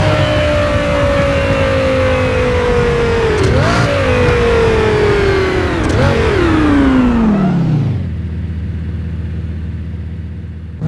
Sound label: v8 throttle off fast loop